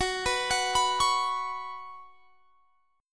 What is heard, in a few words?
F sharp Suspended 4th; arpeggiated; x,21,21,23,24,21